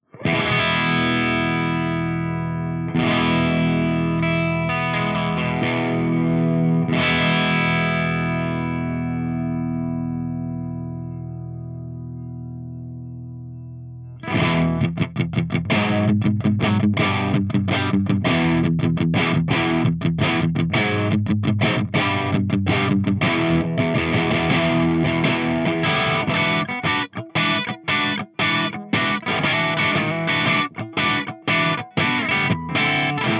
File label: ENGL SOV CH2 CRUNCH V30 HB